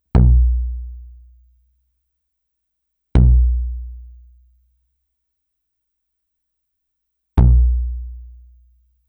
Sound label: Solidtube sin pad